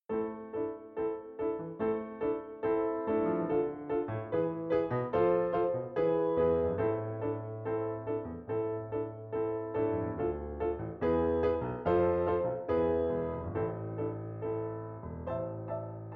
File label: piano; God Rest Ye Merry Gentlemen